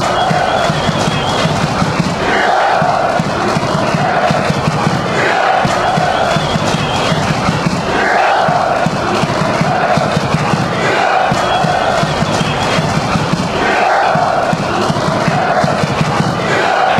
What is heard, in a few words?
claps shout fanchants; agf